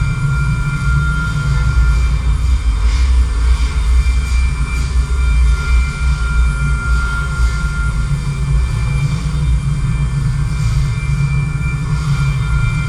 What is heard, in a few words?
Comet Stereo Distant Loop; Journey